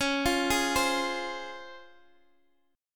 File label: C sharp Major 7th, Guitar, Open G; x,x,11,10,9,10